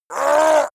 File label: yeti shout short pain